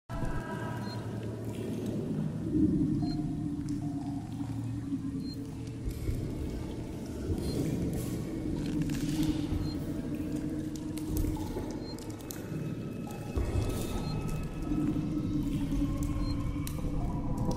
Nest Ambiance; Alien Isolation OST

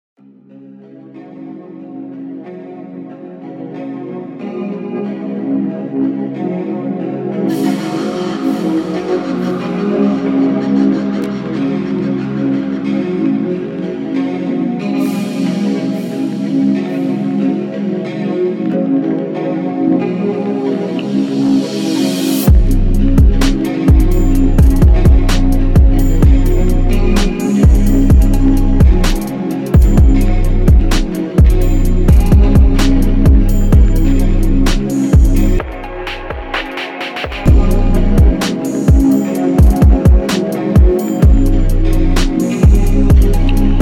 type beat

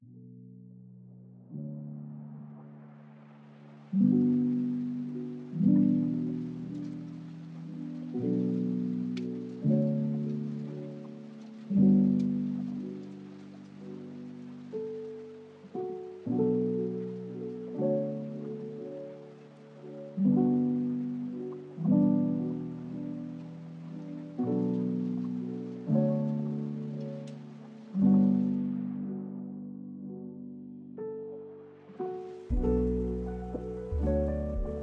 Slowed Reverb

